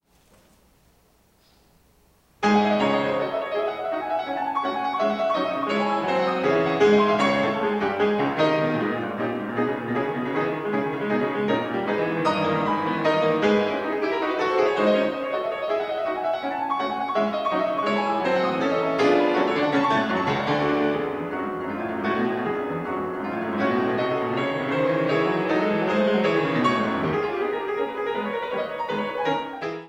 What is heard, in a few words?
Etude in C sharp Minor LIVE, Piano; Chopin; Op10 No4